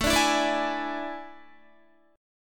A Major 7th Suspended 4th, Guitar; strummed

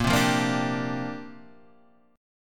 C 7th Suspended 2nd Suspended 4th Bb

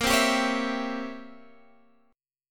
C 7th Flat 9th Bb, chord

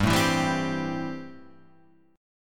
A-Minor 7th G

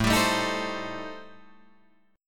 A-Minor 7th Flat 5th, Guitar Drop D, chord

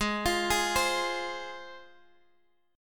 G sharp 6th G sharp down Guitar Drop D, chord

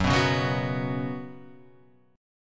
F sharp Suspended 4th

